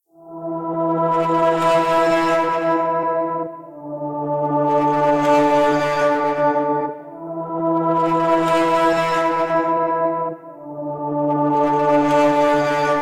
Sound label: Vocal, chop Bed 140 Em; Slate Digital Omnivox Vocal Sample Pack; Chops